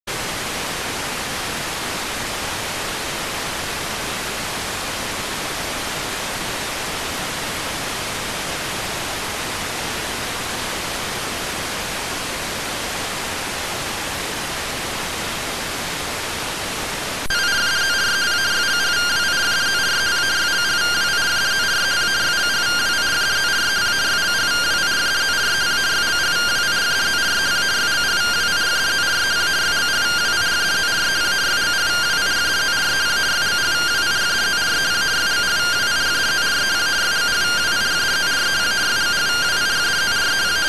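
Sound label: radioteletype receive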